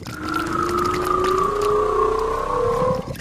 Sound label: reel long spin; Hungry Shark; Wazdan